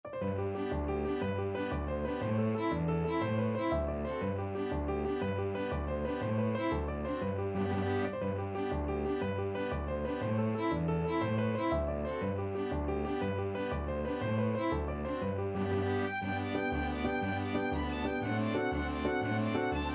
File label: irish jig medley, Piano Quintet; Trad.; Irish Jig Medley for Piano Quintet